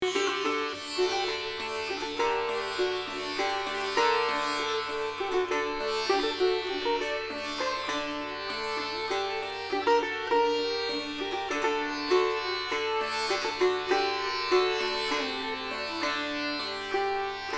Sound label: Charukeshi sitar violin